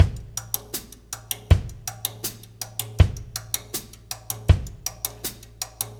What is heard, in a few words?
Russ Loop, Laidback; Sonic Foundry (Sony Creative Software); Loops & Samples Drum Tools